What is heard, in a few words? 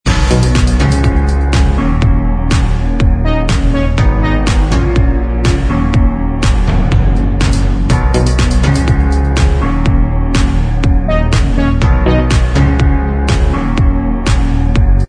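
instrumental track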